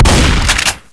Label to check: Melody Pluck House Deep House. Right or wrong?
Wrong